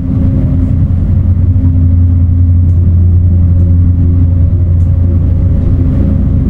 mining laser chargeup; Weapons; EK modern; Barotrauma

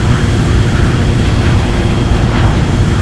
rocket motor; Weapons; ekgunnery; EK modern; Barotrauma

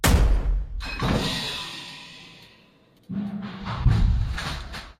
railgun smol shot sequence; Barotrauma; EK modern

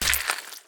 limb slash; Damage; Barotrauma; Subsurface

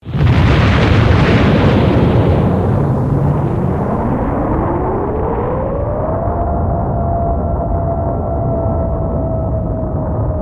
Reactor Big Boom; Barotrauma; Barotraumatic

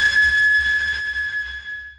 sonar Tail Water Medium Shuttle Directional; Barotrauma; Real Sonar

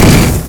laser bore fire; Tools; Barotrauma; EK modern

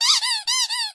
squeak; Barotrauma; Neurotrauma

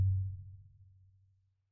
sonar Tail Air Far Beacon; Barotrauma; Real Sonar

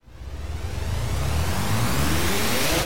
charge; Barotrauma; EK modern

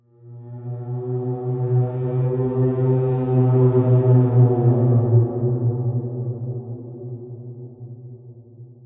Ice Whale damage; Barotraumatic

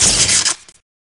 mini discharger; Barotrauma; barotrauma ek; Electricity

